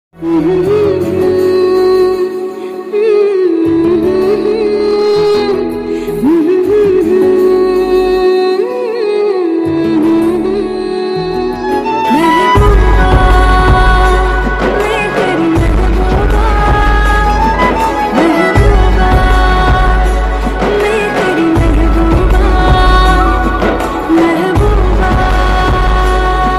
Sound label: female bgm ringtone; rockingstar yash; prashanth neel